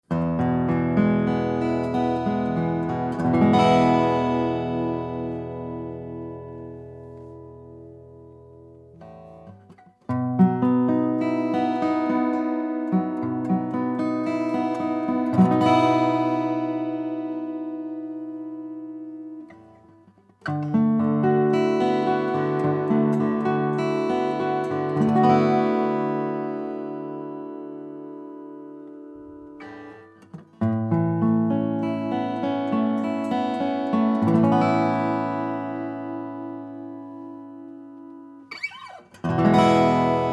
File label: Soundsample F35, lowden f35 ancient bog oak red cedar